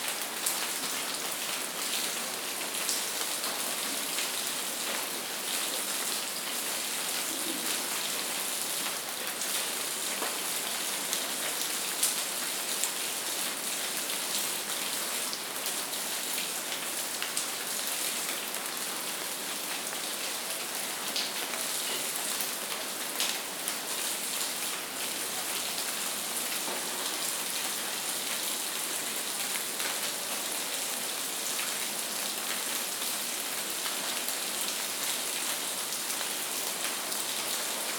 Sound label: Rainloop; android linux macos windows; game rip; lessons in love